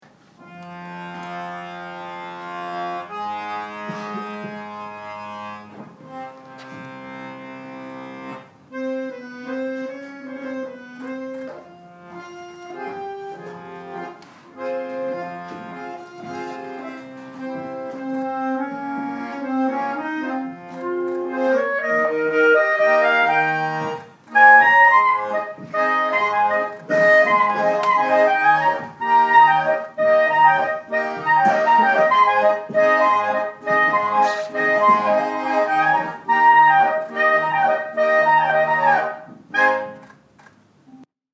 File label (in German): Handorgel und Klarinette; Soldat Teufel